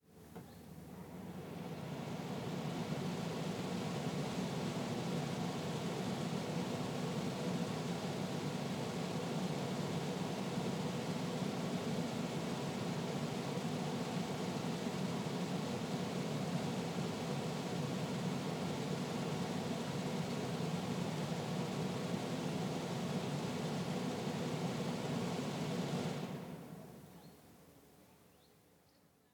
Ventilation Exhaust Air Outside

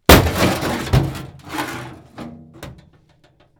Metal Crash

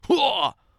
"Whooah"

